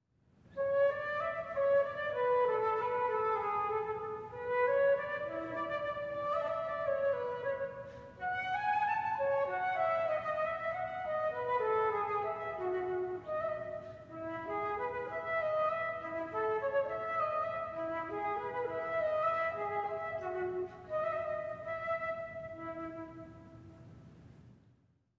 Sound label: Sonata for Unaccompanied Flute; Telemann